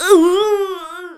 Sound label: Drown; Link drowning in water